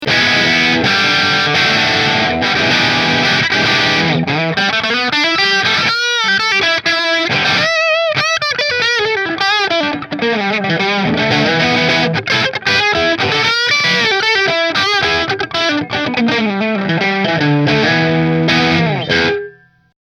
Position 1 Marshall; Rahan Guitars RP Double Cutaway Green Flame Maple Top

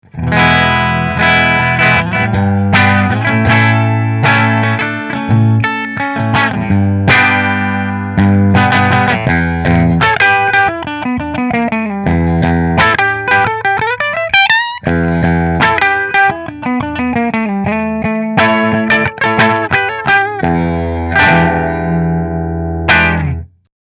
Hamer Aztec Gold Bridge Fender

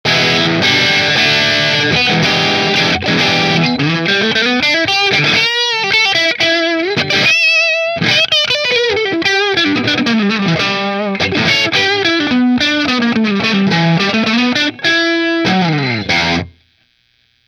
Mcnaught grey middle marshall

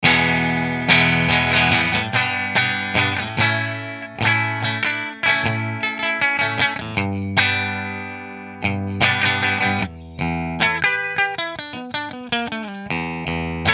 Position 3 Fender; Tonemonger

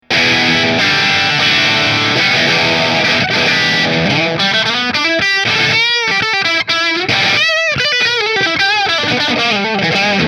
Heritage Bridge Marshall, guitars

